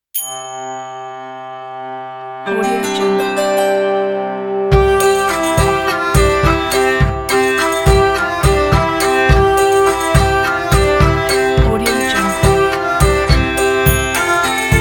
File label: ukrainian hopak